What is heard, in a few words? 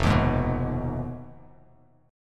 F Minor 7th Flat 5th F down Bass Standard; Fm7b5 strummed; JGuitar